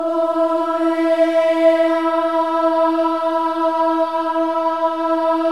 OH EH; Spectrasonics

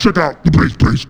vox FX; Big Beat Samples & More

